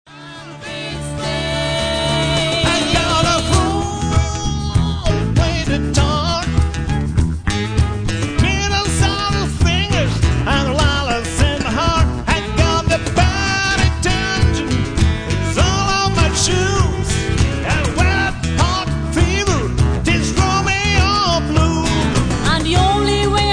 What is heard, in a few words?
Cello